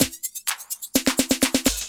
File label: loop, Roland; Sound & Vision - Gigapack I